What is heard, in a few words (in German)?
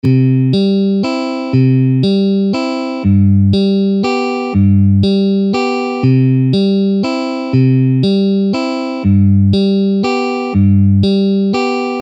Zupfmuster; pfadfinden